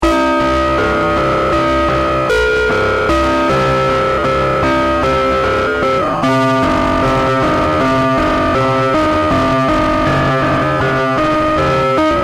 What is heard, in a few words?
chime guitar; deathbyaudio